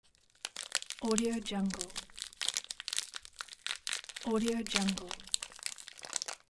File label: candy wrapper